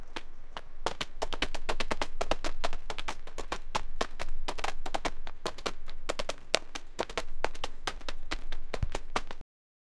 multiple people jogging o

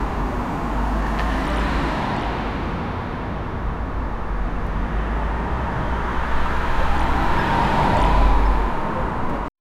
city traffic ambience

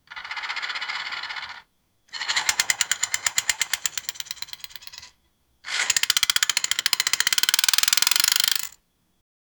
block rotate, game; tetris

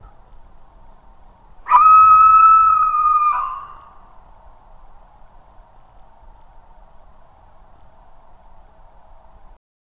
screaming owl

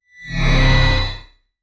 a cinematic tension build, rising volume

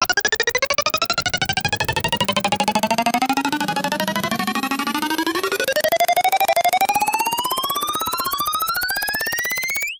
2d retro pixel animation

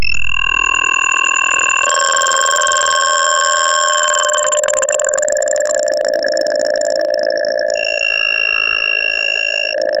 an animation or motion gr, motion graphics

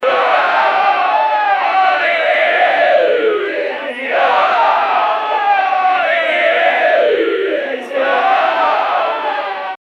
a crowd of people chantin

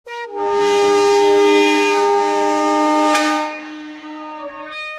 epic battle horn viking

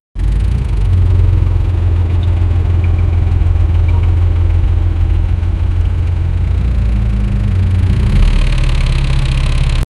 1930 old jeep idling; automobile

